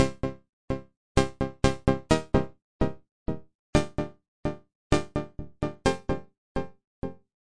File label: 128 organ echo melody; SYNTH AND ELECTRONIC LOOPS